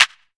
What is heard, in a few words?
clap sample f key; percussion; single hits one shots